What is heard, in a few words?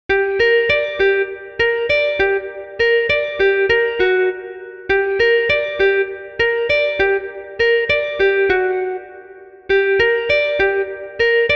moog multimode filter lead sound; universal audio